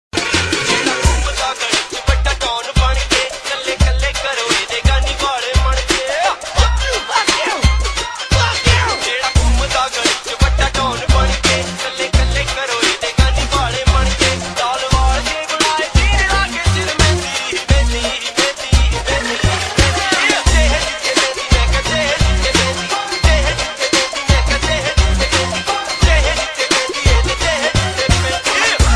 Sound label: Punjabi Mix